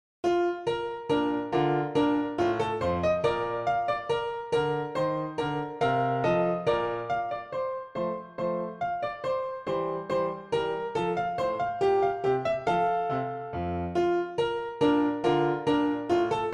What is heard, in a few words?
Dance PianoTeq Bechstein; Dittersdorf 20 English Dances Schott